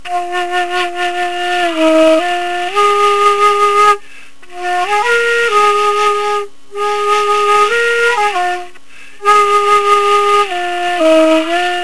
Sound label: shakuhachi uta